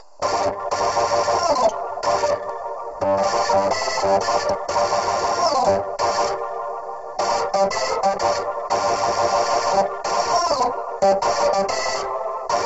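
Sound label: wet organ, Multiband neural audio effect; prism distortion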